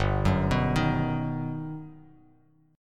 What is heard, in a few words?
G Augmented Major 7th, Bass Standard; G+M7 arpeggiated; JGuitar